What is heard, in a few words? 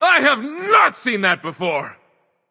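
nonverbal fear; smite; voicepack; merlin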